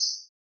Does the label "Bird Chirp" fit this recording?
Yes